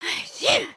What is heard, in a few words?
sneeze